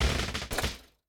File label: car engine stop; factorio; Factorio 0.14.21